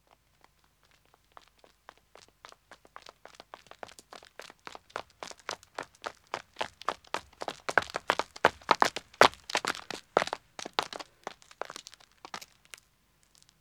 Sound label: Country Road Running 1 man &; Footsteps; BBC Sound Effects Library